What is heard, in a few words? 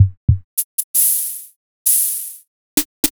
GEM Drum